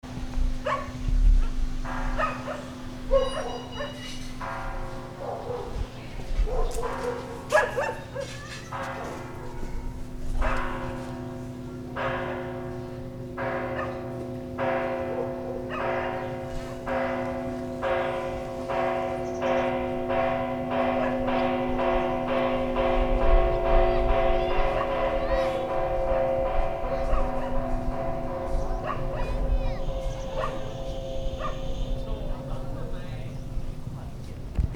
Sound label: BELLS TEMPLE HANOI; 2019